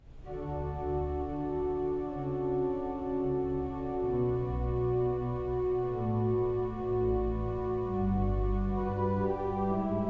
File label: Air for G String; Bach